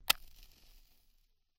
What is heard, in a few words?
back right, binaural recording; theverge